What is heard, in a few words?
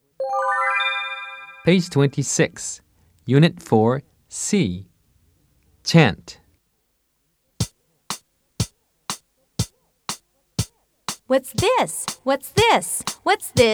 chant